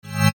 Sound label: Crescendo Tonal; Multimedia; Flash, Animation, Tones, Low, Random, Musical, Production Element, Imaging Element, Accent, Transition; Tone Sound Effects; Blastwave